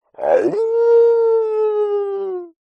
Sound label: cartoon dog howl